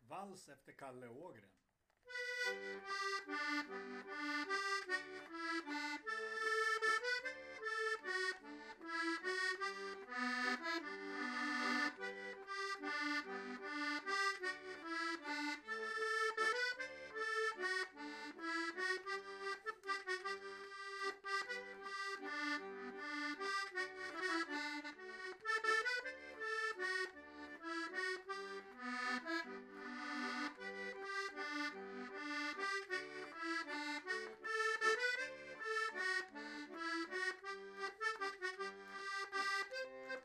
vals; Kalle Ågren

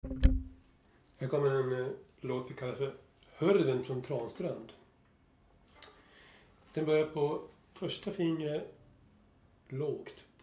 Polska; Skinnar Albin

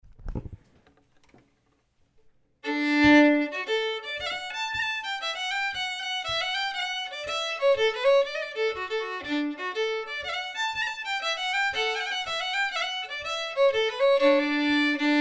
Polska; Ossian Eklund

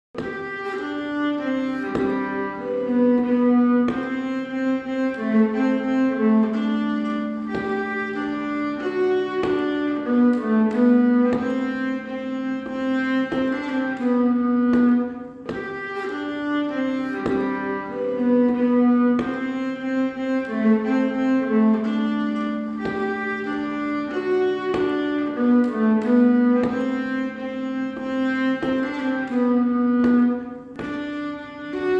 polska stämma

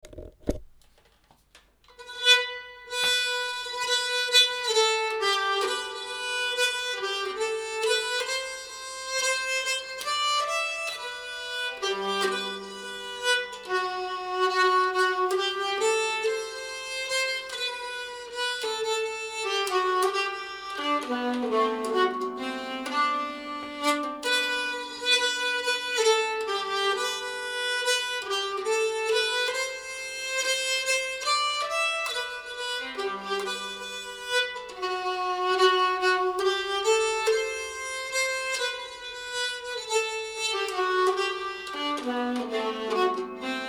Vals stämma; Kall

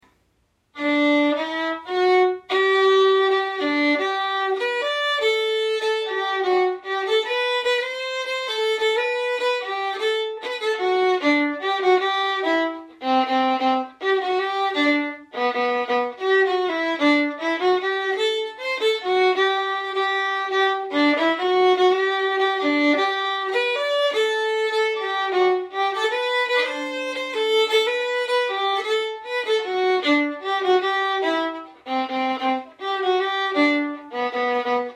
schottis övningstempo

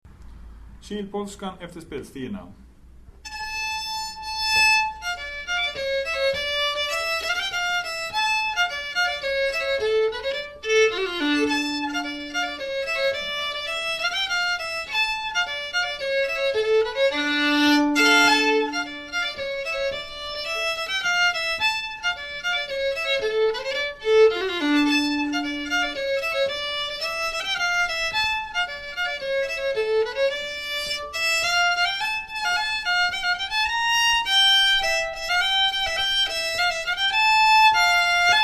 polskan; kilpolskan

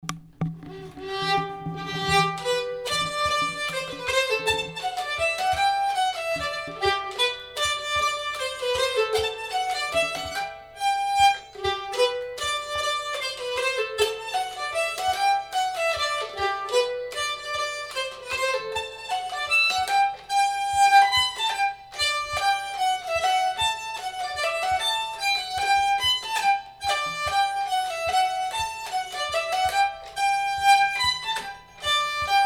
hambopolkette; Eklund

